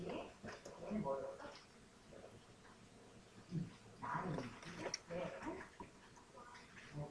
growl

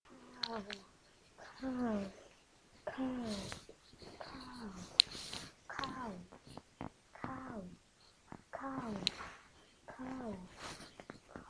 cow

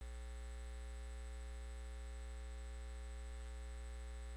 kick

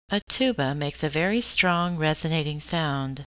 tuba

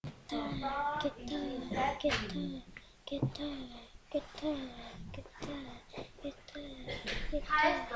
guitar

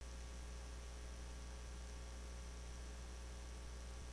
mosquito

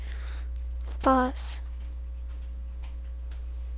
buzz

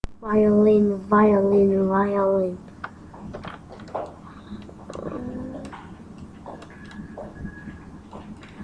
violin

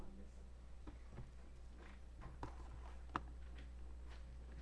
explode

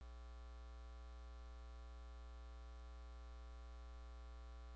sigh

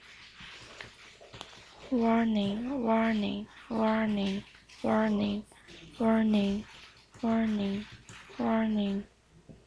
warning

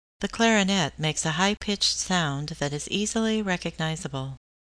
clarinet

